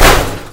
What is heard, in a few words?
impact, truck; vehicles; godot demo projects; truck town